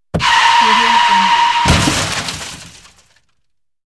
car crash

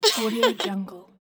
cartoon girl laugh

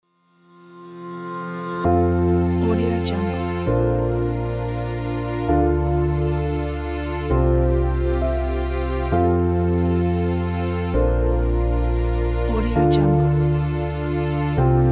inspiring atmospheric ambient

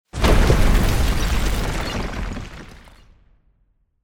mansion explosion; tibasfx

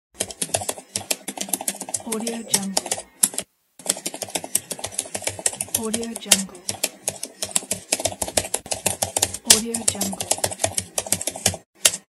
laptop keyboard typing

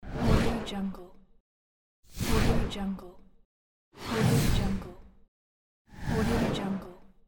transition whooshes

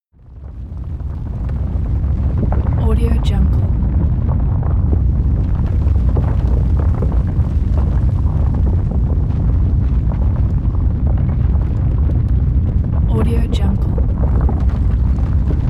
earthquake

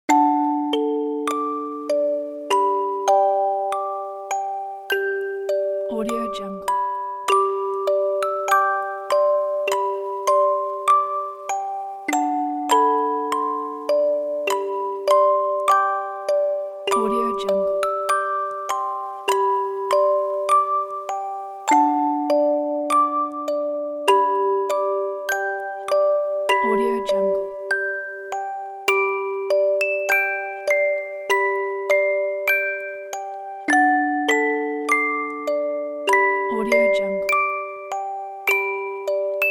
london bridge music box lullaby; applehillstudios

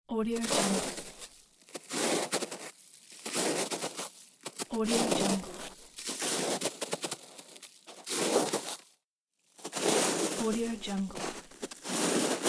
walking on snow; djsapa

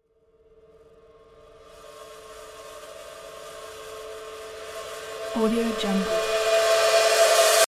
riser

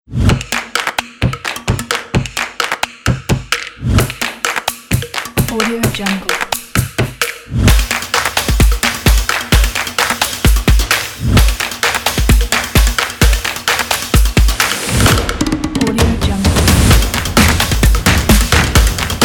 typographic percussion pack

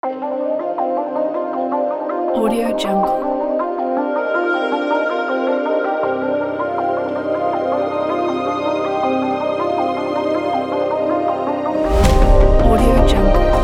cinematic electronic

